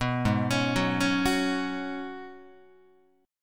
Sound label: G sharp Minor 11th G sharp Guitar, chord; G#m11 arpeggiated; JGuitar